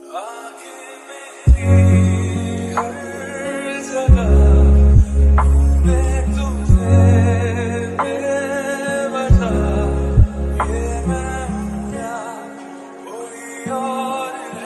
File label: Hindi Love Song Status Ringtone